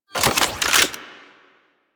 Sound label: Arch Burst Gun Un Equip, Weapons; DE; Warframe; Archwing